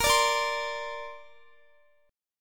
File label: A sharp Major Add 9th, Guitar; strummed; chordshape